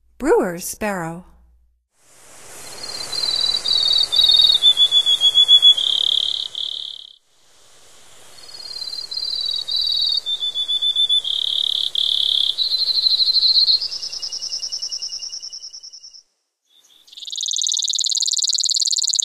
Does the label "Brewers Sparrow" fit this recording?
Yes